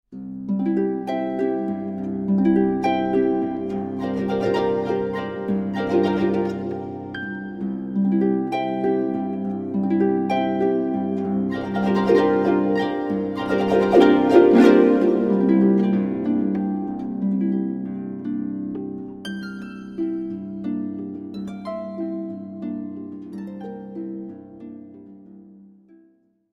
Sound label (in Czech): Harfa